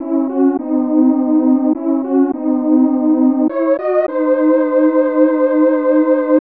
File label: Praying; Legend of Zelda, The : A Link To The Past